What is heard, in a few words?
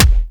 Gunbrella Punch; Weapons; Warframe; Tenno; Koumei